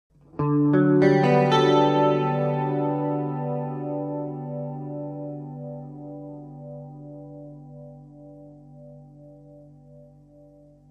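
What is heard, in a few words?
Electric Guitar Melancholy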